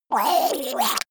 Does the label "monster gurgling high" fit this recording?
Yes